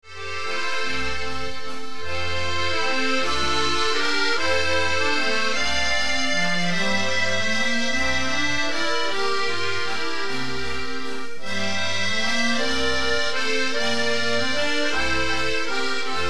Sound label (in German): Italienische Villanesken